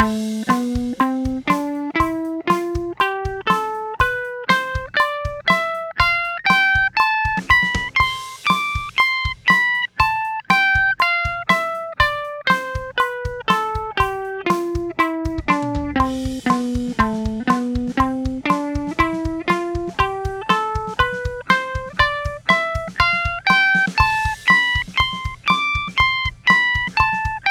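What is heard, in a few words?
A minor #1 Aeolian octave 120 bpm quarter, guitar; a minor diatonics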